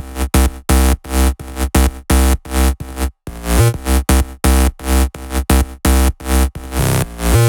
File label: 128BPM Melody; Vengeance Samples; VFH1 128BPM Dogde Kit